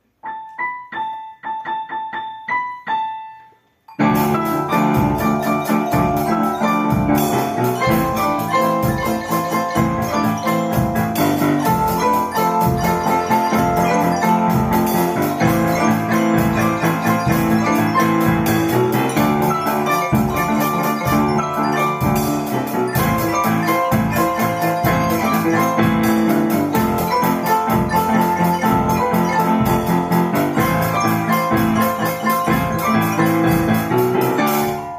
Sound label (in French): sonnerie